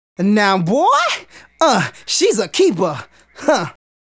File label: Male Solo, Hip-Hop and RnB Vocals